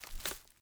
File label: foliage jump; teardown ps5 windows xbox series xs gamerip 2022